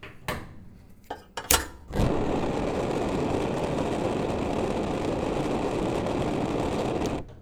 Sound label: Stove